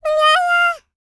LICKS, Vocal Planet Jazz FX; Spectrasonics

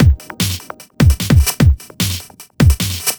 150 Drums, 150bpm, Nu Dance Electro; Zero-G; Soundclash; Combi Loops

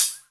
HH, Dance Mega Drums; Best Service; HH HIP

